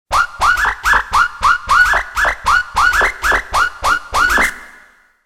Turntable; Soundzabound

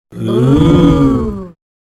Ooohh; Soundzabound Volume 3 Disc 3

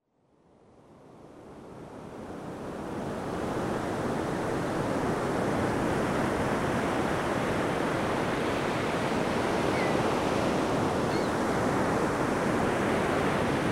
Ocean; Soundzabound

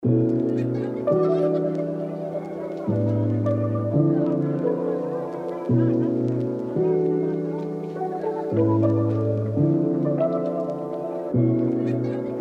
Deep Jazzy Lofi

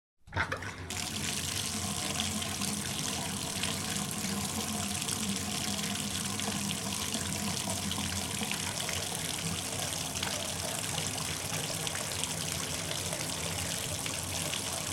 Tub; Soundzabound

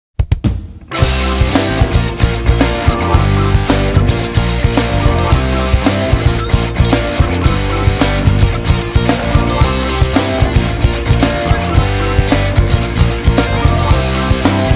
Rhythm Rock; Soundzabound Volume 4 Disc 1

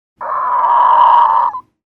Bad Breaks; Soundzabound Volume 3 Disc 3

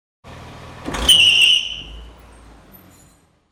Car Squealing Out; Soundzabound Volume 3

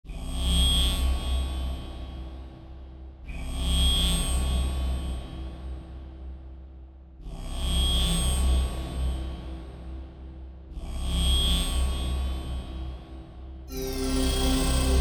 Pulse Electric

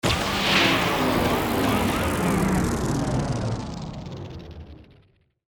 Digital Jumble

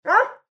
dog bark

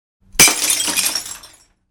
Glass Breaking; Soundzabound

